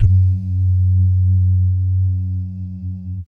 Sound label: BASS DUMMS, Vocal Planet Jazz FX; Spectrasonics